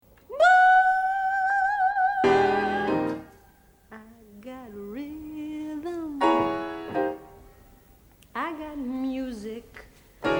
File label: Symphonic orchestra